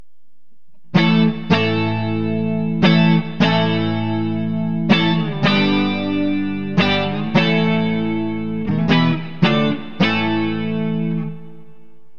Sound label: neck clean; burny